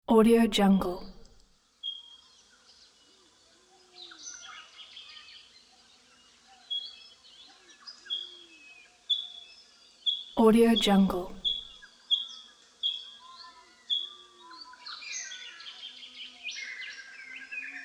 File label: birds chirping